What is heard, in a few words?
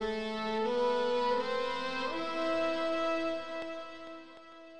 HORNBG; HipHop Fx; LiveAct Pool 1